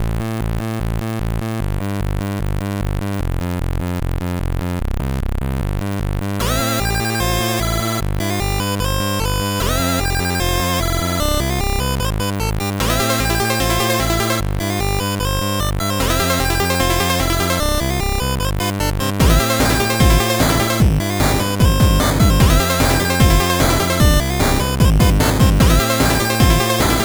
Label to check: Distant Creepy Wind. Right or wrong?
Wrong